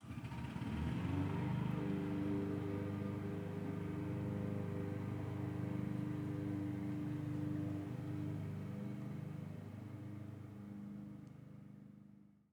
Lawn Mower Up and Away INT Persp; Blackmagic Fairlight

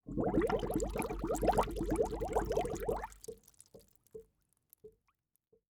Liquid Water Bubbling Deep Slow

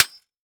Impact Sword Impact On Metal Armor

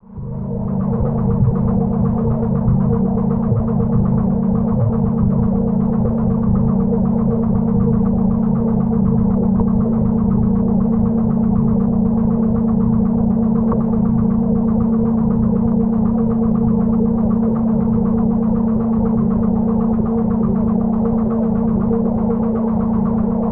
Drone Bed Pulsing Chatter; Production Element Imaging; AdobeCC

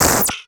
Multimedia Internet CD-Rom Flash Blast

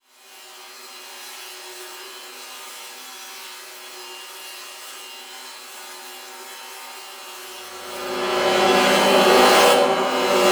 Transition Stab Dark Metallic; Production Element

